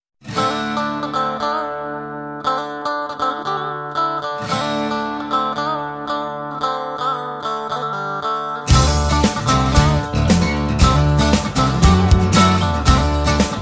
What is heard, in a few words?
ele guitar n drum, rock; ringtones